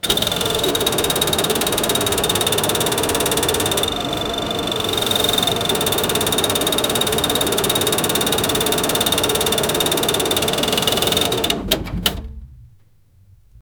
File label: sewing machine stopping; domestic